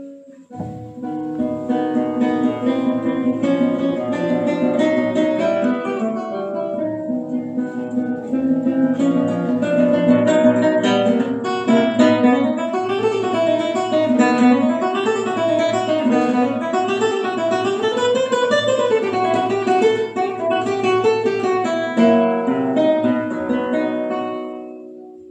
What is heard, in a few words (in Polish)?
recital gitara; Coste